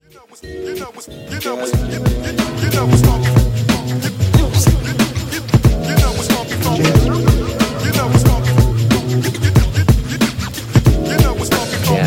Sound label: jazz loops